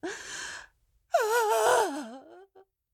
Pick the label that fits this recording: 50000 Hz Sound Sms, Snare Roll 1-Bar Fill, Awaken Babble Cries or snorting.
Awaken Babble Cries